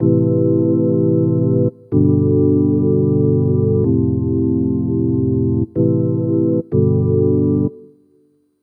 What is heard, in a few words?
DEEPHOUSE ORGAN 125 A